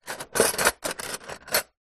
household stainless steel cutlery movement in drawer; zapsplat